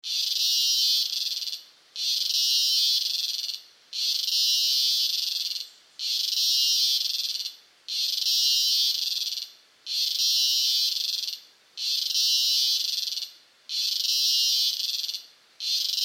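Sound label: Insect Cicada close up Costa Rica; Insects; Animals; Big Room Sound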